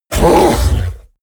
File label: fishman roar frightened; kreaturen